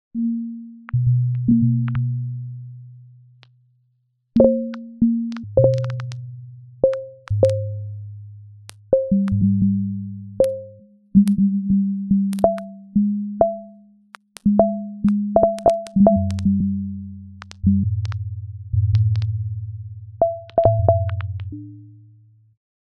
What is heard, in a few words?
dry